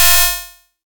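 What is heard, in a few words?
Open hi-hat; Trance; Zero-G Trance Inducer; 90 s Sample CDs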